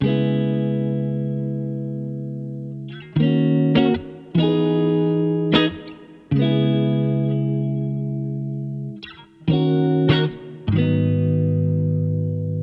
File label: 152 BPM B Maj Guitar, loop stems; Cymatics; 2022 Melody Collection Platinum Expansion - Pt 1; Forever